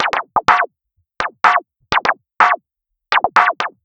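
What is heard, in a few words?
Bandpassed, percussion; перкуссия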